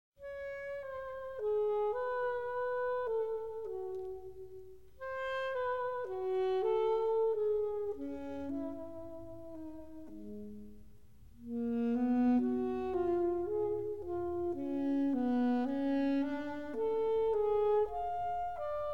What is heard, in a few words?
Contrapuntal Blues